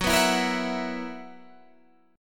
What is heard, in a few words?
B Major 7th Suspended 4th Gb